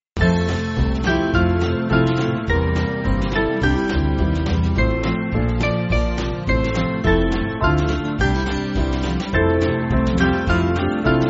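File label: Band, Music Backing Files for Churches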